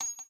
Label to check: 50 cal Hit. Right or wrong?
Right